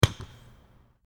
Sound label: Basketball dribble